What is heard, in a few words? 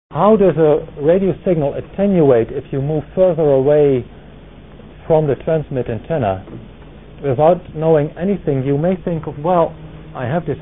prop, audio